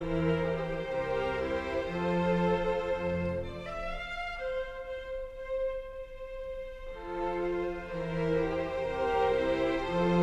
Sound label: Adagio Cello; Haydn